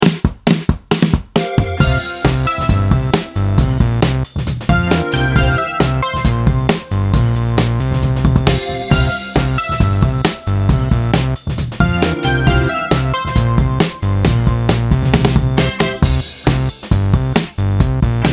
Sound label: KARAOKE